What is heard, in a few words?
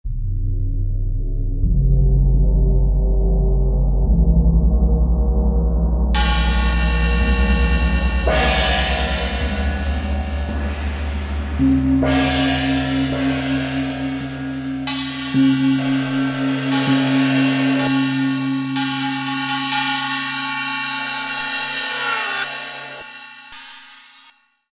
Gong; Roland SR-JV80-05 World